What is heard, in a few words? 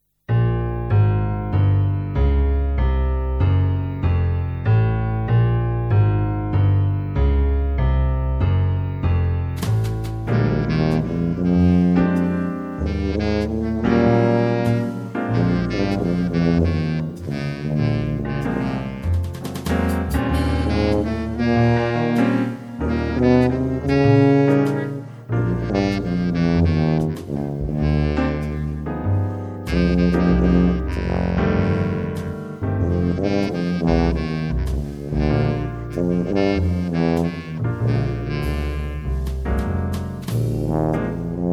Tuba